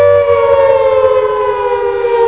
Siren; Klik&Play体験版; ALARMS

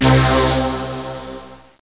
fat sq, synths; Zoom - Release 2 (1996); Active Software

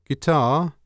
guitar; CD Action 18b 1997

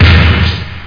Snare Un; Sound Library 2000; Wayzata Technology

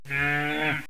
MOO; Tempra Access; vision

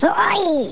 die; gubble

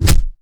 punch

